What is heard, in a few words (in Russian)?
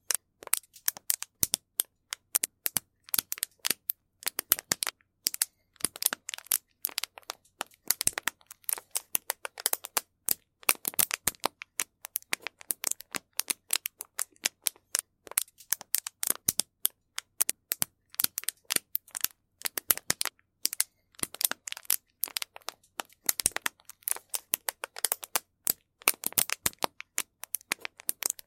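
потрескивающий огонь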